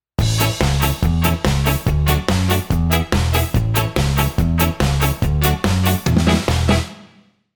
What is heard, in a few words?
guitar pattern